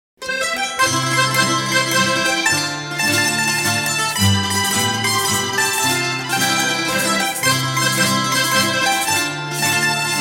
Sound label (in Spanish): Mazurca; Escuela de Folklore de Guadalajara